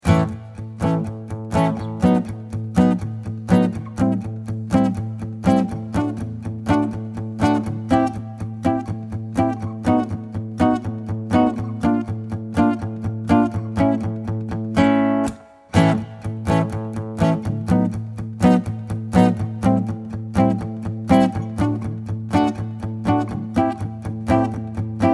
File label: Power Tango